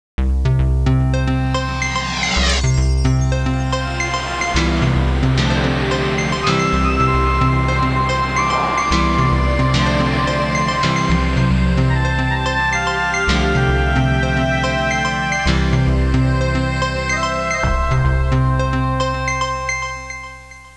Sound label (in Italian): musica elettronica